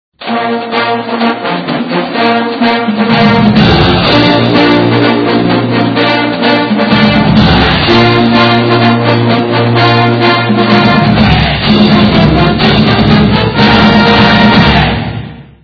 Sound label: Low Brass Cheer